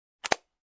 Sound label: Camera Lens Clank